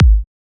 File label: Kick; Ultimate Kick Collection